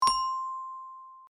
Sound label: bet end; powerball evo